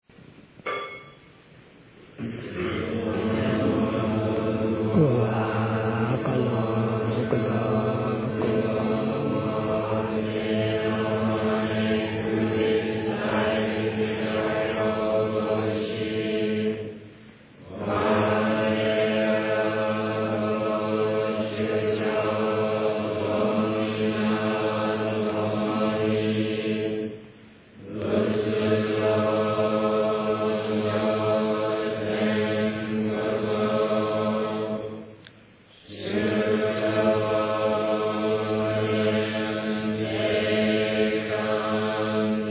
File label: end chant